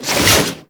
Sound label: Transform Claws Whoosh; Warframe; Weapons